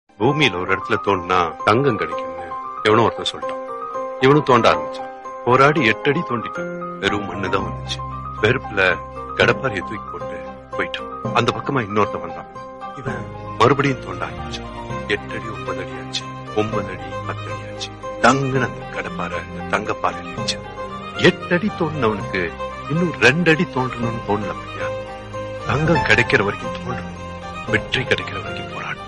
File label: hard work tamil motivational bgm